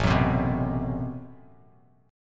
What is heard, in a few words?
A Minor 6th Add 9th A Bass Standard, Chord; Am6add9 strummed; JGuitar